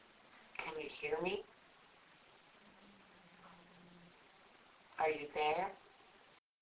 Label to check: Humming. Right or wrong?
Right